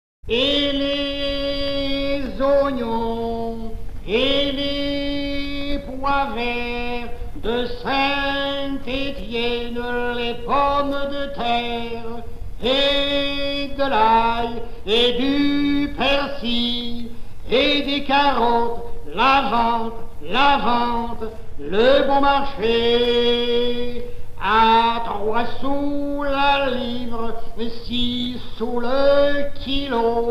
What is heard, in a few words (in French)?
cris de la rue; gascony,ariege,bearn; FRANCIA - DALLA BRETAGNA ALLA PROVENZA, DALLA GUASCOGNA ALLA CORSICA, DAL 1913 AL 2000